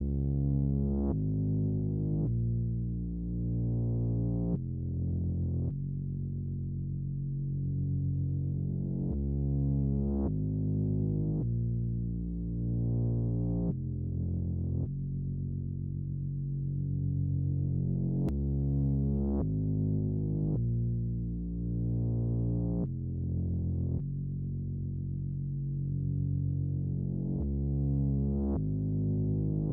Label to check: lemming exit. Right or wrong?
Wrong